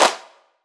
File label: clap; bespoke - Floral v.1; drum oneshots